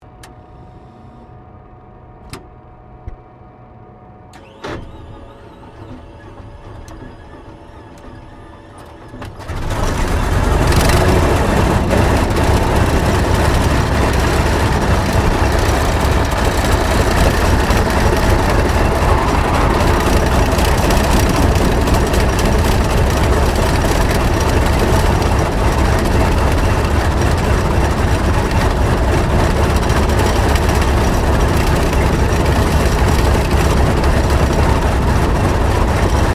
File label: AIRCRAFT T-28D TROJAN STARTUP INTERIOR; Airplanes; Vehicles